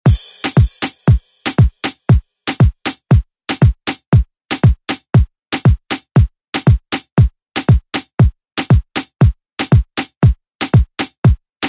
Re Drum; DJ Mhark

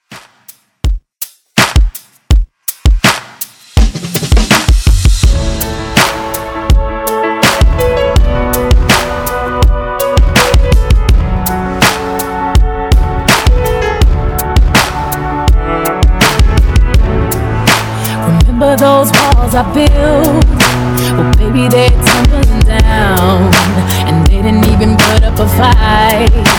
20s Redrum 82bpm

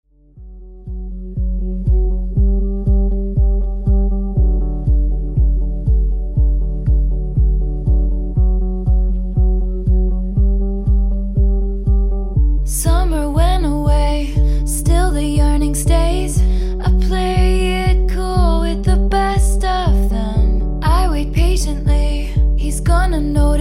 top40 mixshow intro 120 bpm

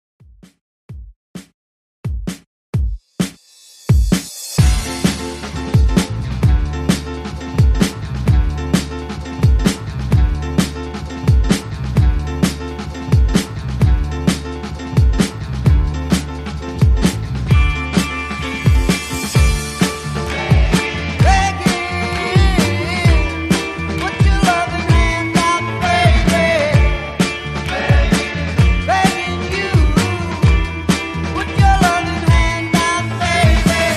60s Redrum, 130 Bpm

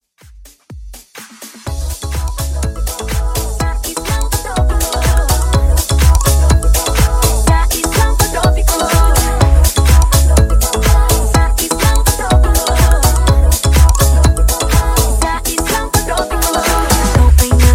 Funk 124 Bpm

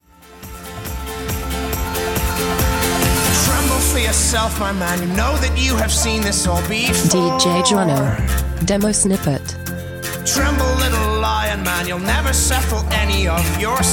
139 bpm F; REDRUM

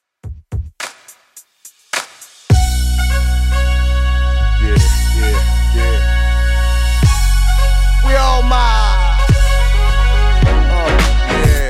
Throwback Rnb Redrum 106bpm

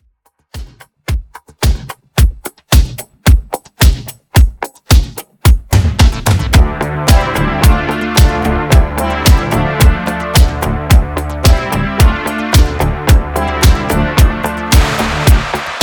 Re drum

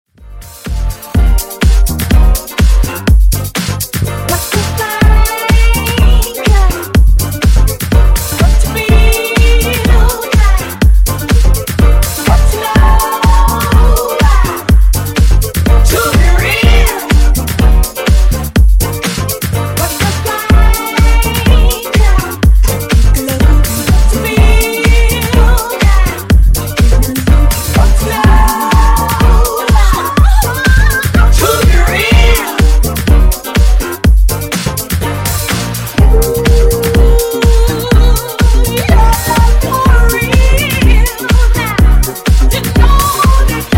Funk Nu Disco House 120Bpm

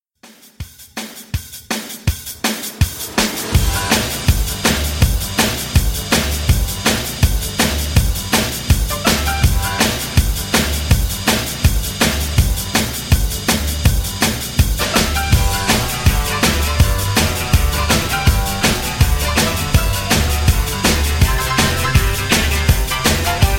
50s Rock Re Drum 163

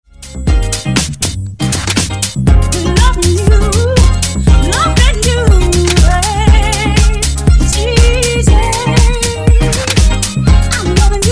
Funk NU House, 120Bpm

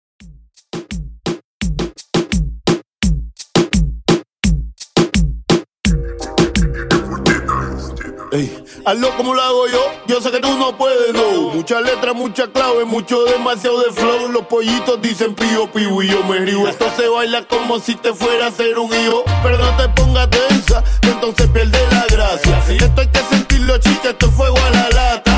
Reton 85Bpm

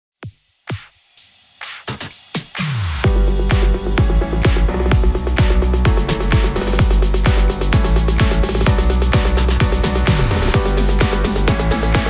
128 BPM; MASHUP

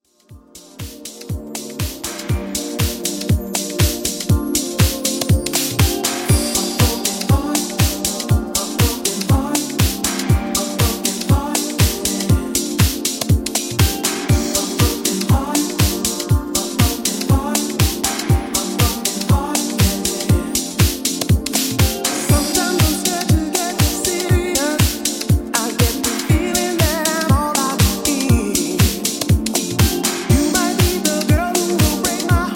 80s Redrum 120Bpm

